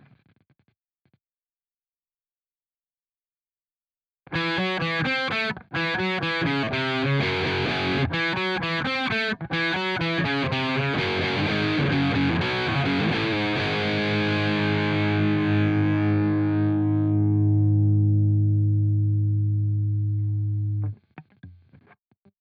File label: Guitar